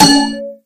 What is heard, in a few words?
lucky proc; lucky shot balatro